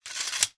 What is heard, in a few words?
medieval sabre place in sheath fast; still north media